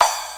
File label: damped open hat b key